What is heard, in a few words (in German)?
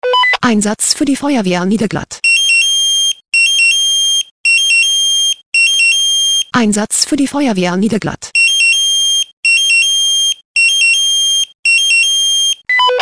SMS Alarmierungstone; FW Niederglatt